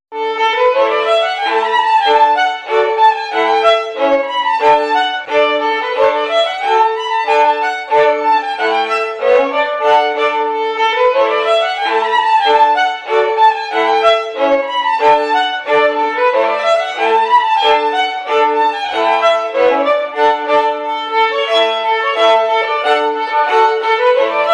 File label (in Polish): Kapela tramla